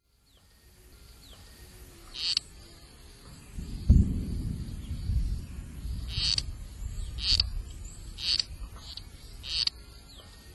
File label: Blommersia sarotra